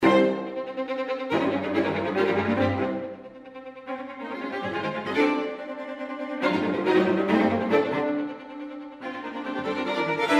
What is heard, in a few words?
String Quartet A Minor Fourth Movement; Walton